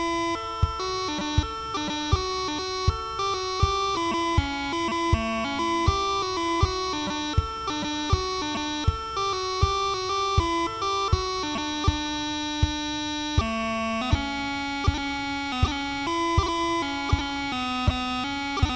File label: Transition - Irish Washer woman - Wearing the Green, drumming; highlanders